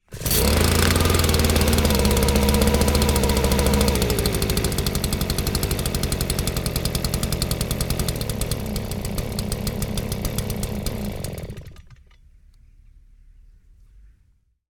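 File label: leaf blower engine onboard cold start off; Sonniss GDC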